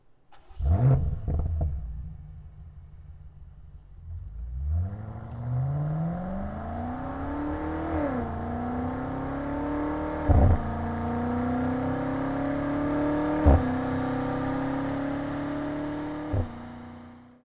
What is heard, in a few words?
BMW M8 Competition Coupé Motorsound; dingolfing